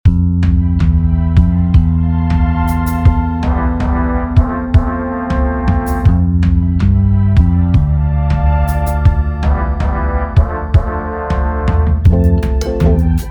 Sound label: Organika Retro Starters; Multiton Bits